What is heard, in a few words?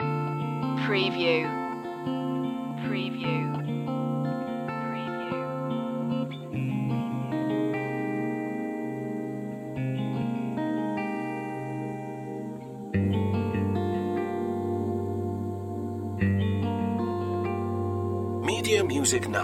guitar stories loop